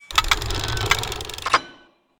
Ring Puzzle

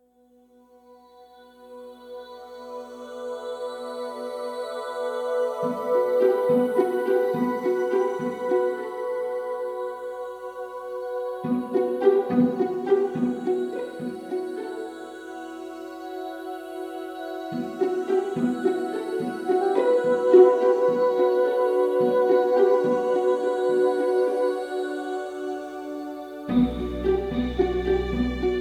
Music; moul; KVeer

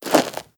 Dirt Turn

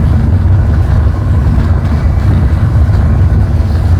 Timer Loop

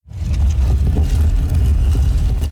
sec door close; moul-assets

